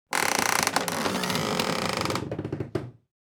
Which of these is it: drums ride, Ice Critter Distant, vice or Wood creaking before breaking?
Wood creaking before breaking